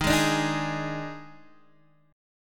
Eb Major 7th Suspended 4th, Guitar, Half Step Down